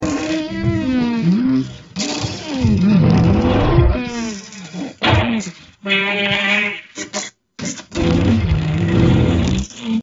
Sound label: ai fart